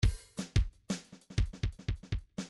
midi file grooves

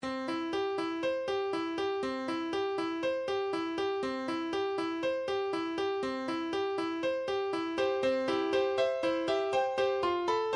musicbox

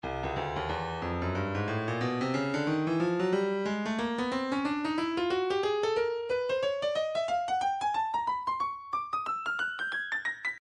breakbeat 091 bpm; Stylus; Busted

